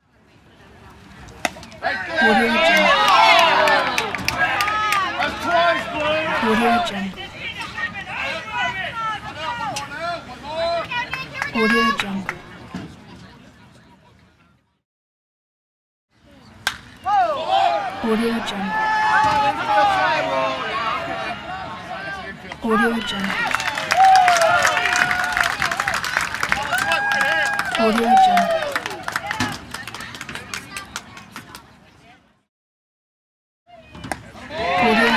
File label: baseball crowd reaction pack